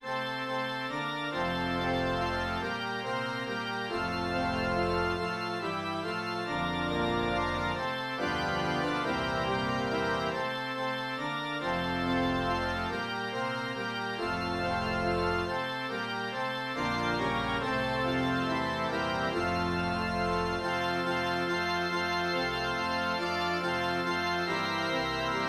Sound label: organ